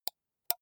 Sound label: computer mouse left click single slow